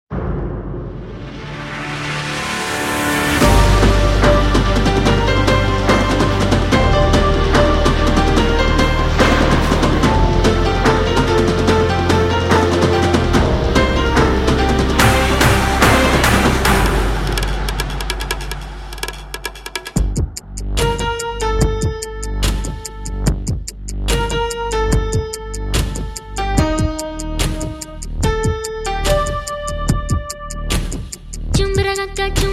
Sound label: Karaoke; Eurovision 2023 - Georgia